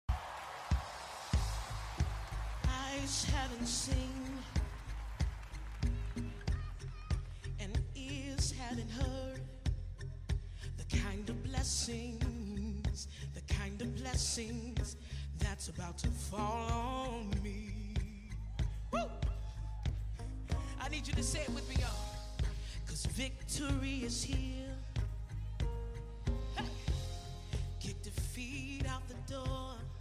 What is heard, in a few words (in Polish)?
religijny